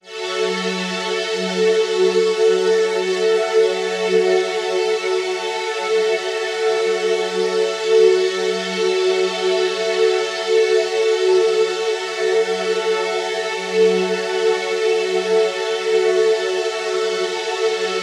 GForce VSM IV VP-330 String Ensemble Phaser Ensemble